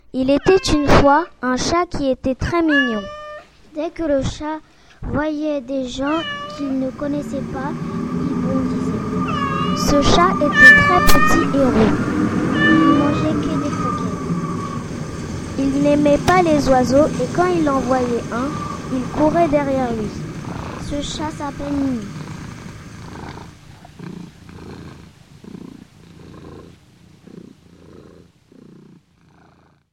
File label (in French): Chat